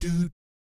DOOT F3, Jazz FX; Spectrasonics; Vocal Planet; JAZZ COMBO

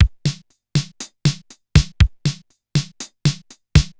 TR 505 (120BPM), beats; musicradar; cyborg beats

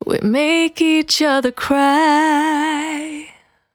Cry 68bpm B, Vocal; Zero-G

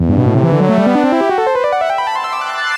Em74 Melodic Turn

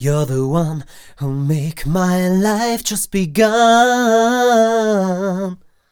m 128 e-flat, Vocals

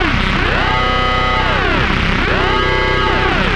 SFX; Sonic Foundry (Sony Creative Software); Afterhours EDM; Session 10 - Come In Hard

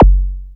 bassdrums, techno; Techno ID; single-drums

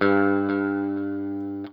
NOODLE LONG, Guitar & Bass

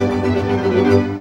VIOLA BREAKS; Best Service; World Colours

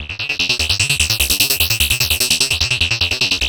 Analog Rhythm

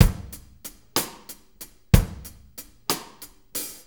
6-8 Loop, Full Kit Loops

